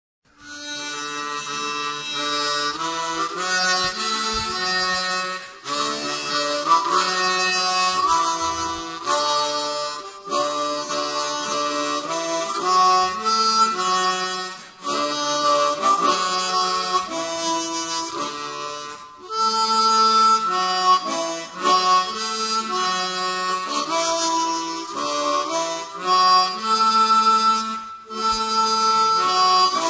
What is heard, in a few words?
harmonica duet